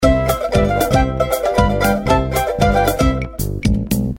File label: Paradise Island, ringtones; ZTE Maven 2 Z831